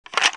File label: plastic insert